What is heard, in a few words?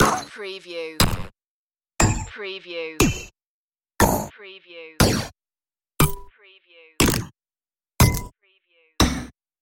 interface error clicks